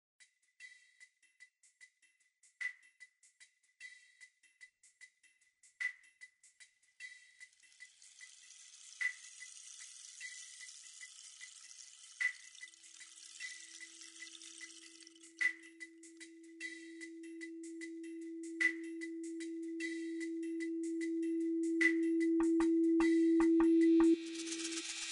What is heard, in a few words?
fantasy forest